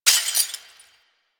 desktop glass destroy; ambience; Warframe; Entrati

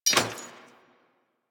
Arch Burst Gun Mech, Weapons; DE; Warframe; Archwing

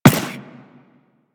Run Default, Footsteps; Warframe; Teshin

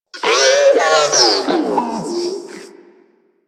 Repair Drone Idle Angry; Warframe; Necramech Repair Drone

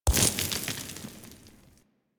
Horse Surface Kickup Dirt; Vehicles; Duviri; Steps

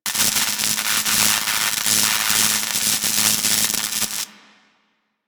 Beam Impact Default Loop; Warframe; Weapons

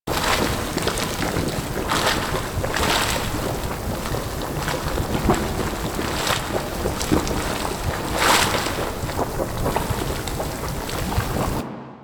Bubbling Water Loop; Queens Lair

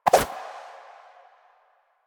Run Whoosh, Footsteps; Enemies; Soldiers; Warframe; Lasrian; Butcher

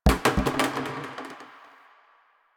Plastic Hit; Warframe; Ambience; Nineteen Ninety Nine

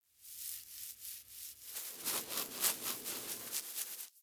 Foley Interact Sleep Scratch; Enemies; Kubrow; Warframe

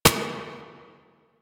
Lasrian APC Turret Low Clip, Weapons; Warframe

